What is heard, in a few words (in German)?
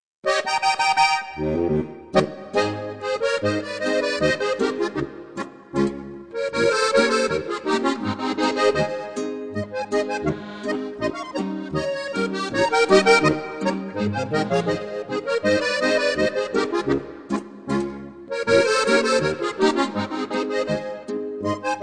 boarischer